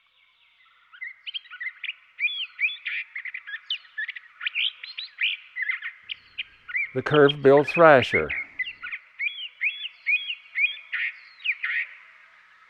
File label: Curve Billed Thrasher; Western North America; Dan Gibson; Wildlife Identification By Sound